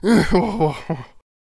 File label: Death Vocals